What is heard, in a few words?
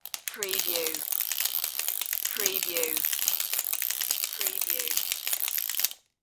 Dual Ratchet Turn; Sound Effects Bible